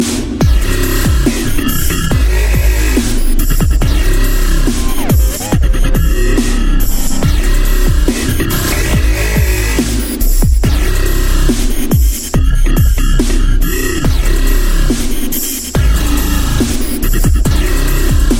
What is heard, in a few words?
dubstep